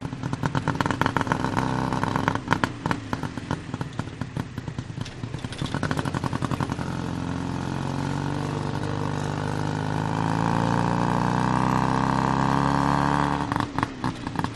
tuctuc ride loop

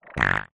human baby poop wet squelch

